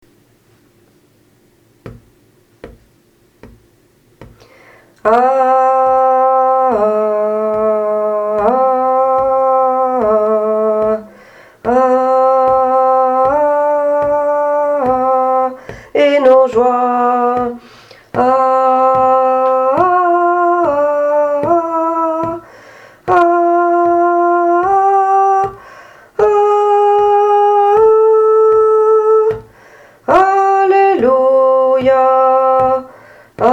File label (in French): Hallelujah 3 voix chant basse